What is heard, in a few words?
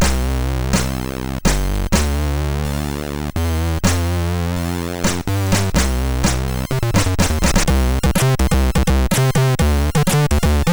Loader, sid MOS6581R4; Chiller 2